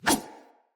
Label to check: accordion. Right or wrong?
Wrong